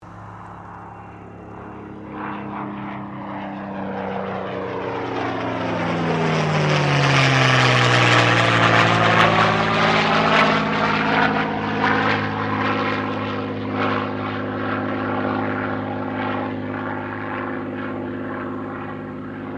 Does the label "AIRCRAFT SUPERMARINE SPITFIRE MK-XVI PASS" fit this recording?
Yes